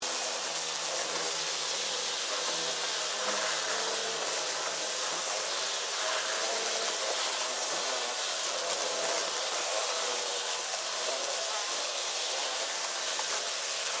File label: Infestation, Animal, Infestation, Light, Wings, Fly, Insects, Bugs; Insect Movement; BlastWave